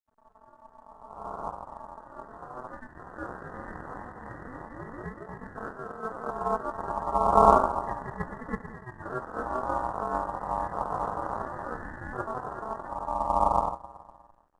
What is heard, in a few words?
Noise; alone in the dark 4